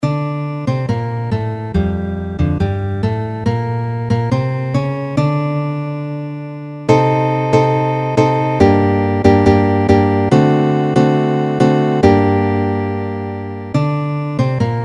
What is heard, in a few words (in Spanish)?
tango